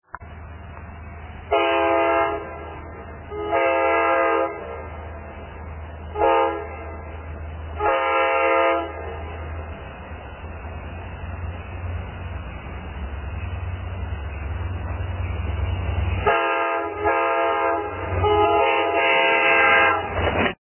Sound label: DE30AC K5LA 3rd generation; lirrhorns